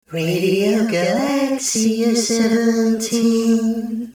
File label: Jingle; Radio Galaxia